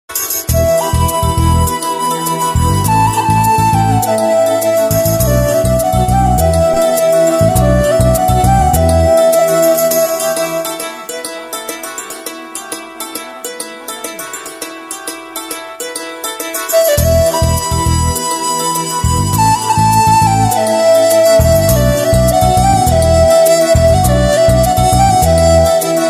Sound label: tamil love bgm ringtone; kovil